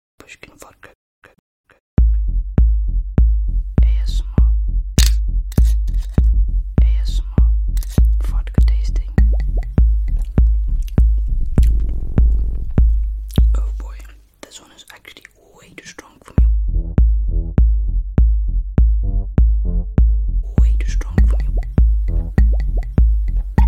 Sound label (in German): wasser